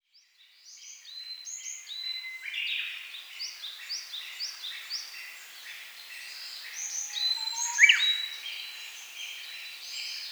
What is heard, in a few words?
bird